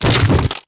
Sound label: deadfall metal, foley